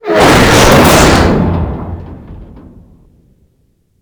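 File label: vehicle death wreck crush; WAR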